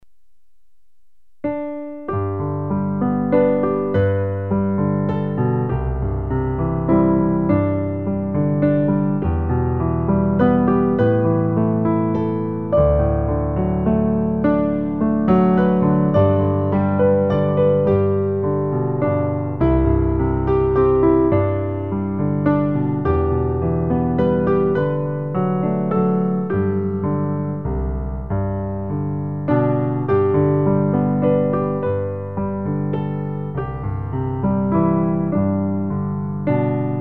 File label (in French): piano; recueil Louez Dieu